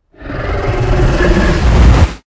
Laser Charge; Moon Lord

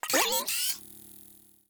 Droid Hurry; Wulfrum

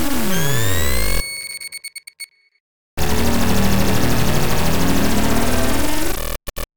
Laser

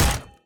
Screwdriver Thud

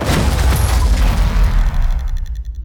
Gravistar Slam

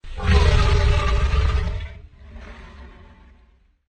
Leviathan Roar Meteor

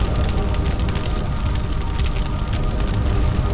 Burn Loop

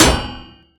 Exo Hit

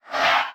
Recharge; Bloodflare Ranger

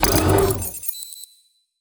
Computer Install

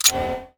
Icon Hover; Ares

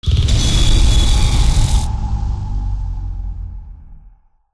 Weapon

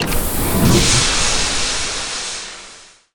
Vent

